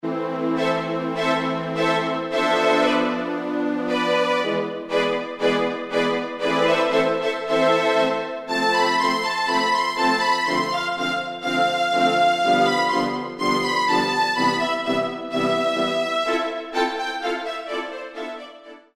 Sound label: Chmbr, Yamaha TG33